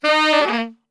D# 2 SAX; Best Service; Brass Super Section

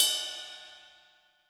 ride, Zoom MRT-3B

